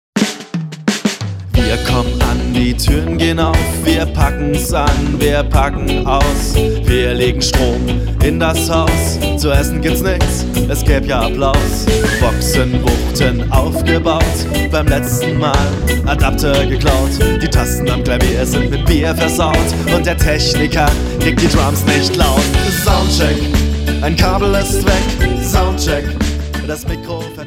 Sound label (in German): Soundcheck